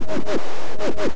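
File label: FX REVERSE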